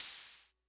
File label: Cymbal, mfb 503; classic machines